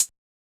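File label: Hi-Hat Closed; Yamaha DJX-2B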